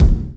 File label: old school boom steel kick drum c sharp key